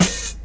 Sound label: SD, dance drums hip